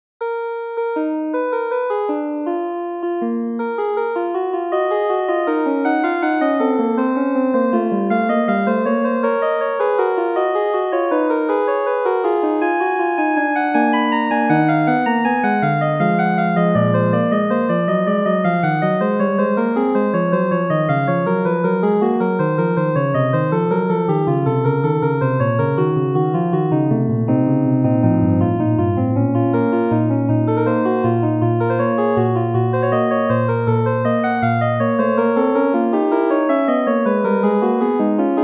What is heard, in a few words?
prelude meantone false